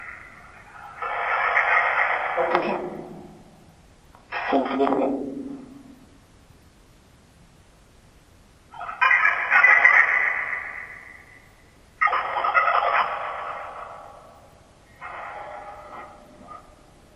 Phasma Box; Third Floor Hallway

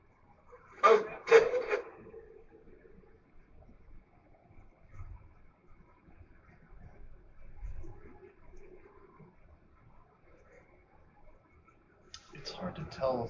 Master Bedroom Portal; ross house investigation

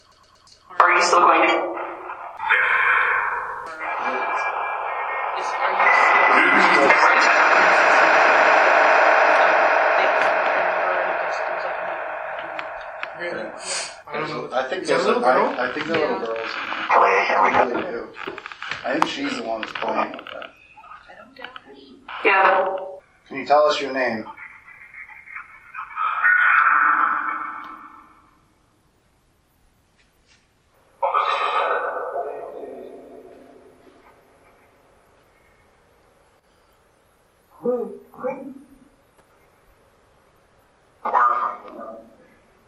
Room Phasma; blennerhassett island; Margaret Room; Are you still here; elizabeth grace blennerhassett; case study 4 investigations

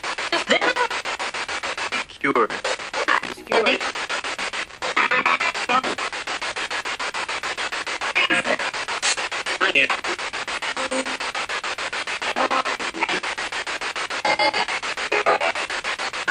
Spirit Box

